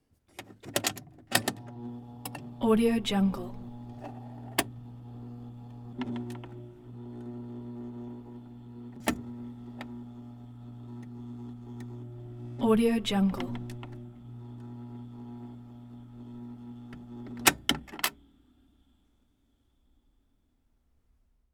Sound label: record player ge wildcat portable switch on